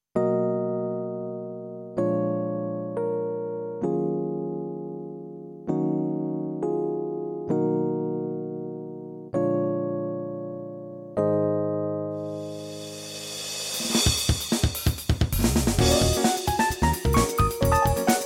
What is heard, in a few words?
bass; bassfilter